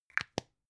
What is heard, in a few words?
plastic spray can small close short